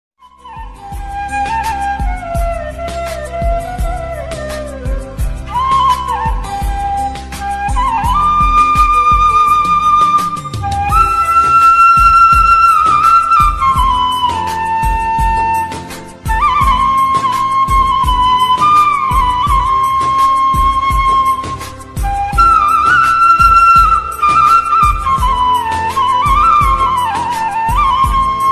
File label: flute ringtone instrumental ringtone